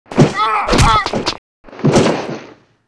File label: scuffle; cs wolfenstein